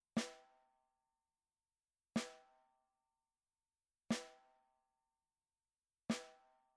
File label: SNARE Power Center Edge Soft